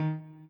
chord

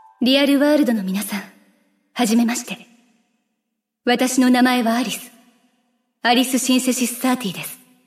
applause; osu

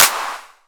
clap airy

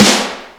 soft hit clap; osu

